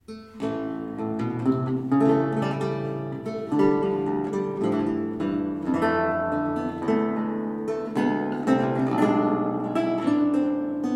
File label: Courante, Works for Theorbo; Musique pour le Roy Soleil; Robert de Visee